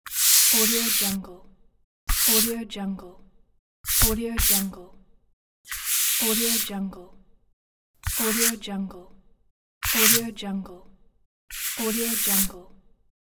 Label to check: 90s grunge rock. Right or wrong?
Wrong